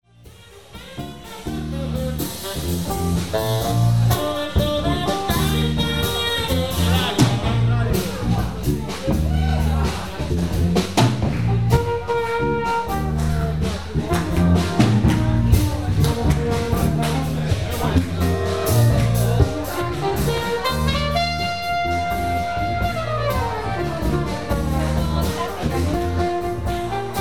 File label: barga jazz afterhours